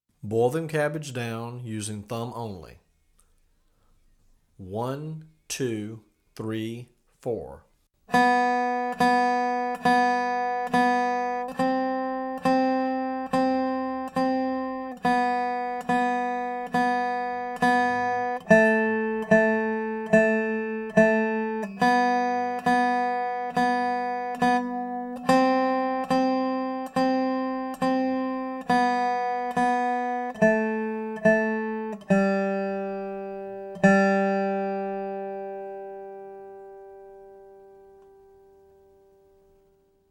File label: Slow; Boil Them Cabbage Down